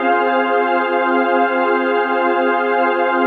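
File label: chord pad, Pads; Best Service; XX Large - Pads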